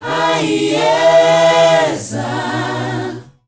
MIX.CHOIR; Spectrasonics; Heart of Africa 2

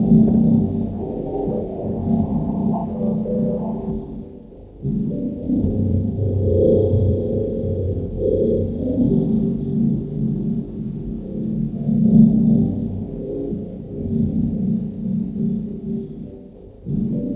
Great Dark Spot, Environments; Sonic Foundry (Sony Creative Software); Myths of Technology

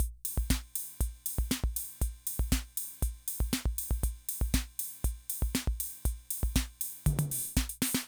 Loop, rhythm 55, classic machines

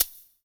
SHAK, SHAKERS; Best Service Dance Mega Drums

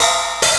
Suzuki RPM-40

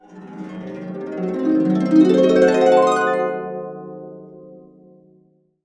C DIM7 CA UP, CASCADE UP, Complete Harps; USB Soundscan vol.62; AKAI

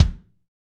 BD, DR BD; Best Service Gigapack 2

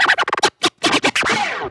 Vocal Scratches 140BPM; Vengeance - Essential Clubsounds Vol.4

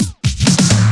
Fills 128BPM, Clubsounds

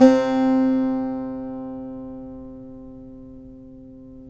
SAZ; Spectrasonics; Hans Zimmer Guitars VOL-2

